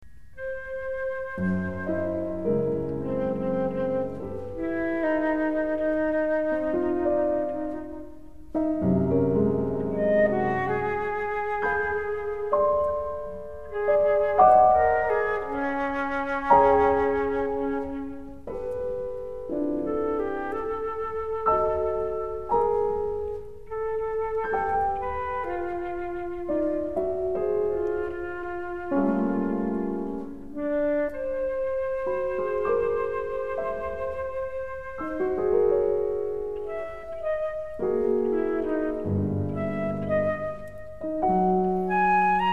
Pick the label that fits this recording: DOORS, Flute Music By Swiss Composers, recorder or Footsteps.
Flute Music By Swiss Composers